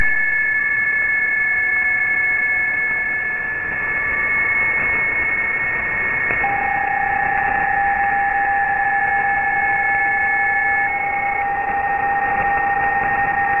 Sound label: Bpsk31